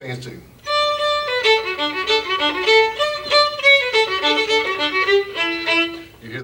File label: Rocky Mountain Goat aka New Rigged Ship Hornpipe, musicbox